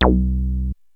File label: REZBASS 303; Rubber Chicken Software Sample Bank; DRUMS + PERC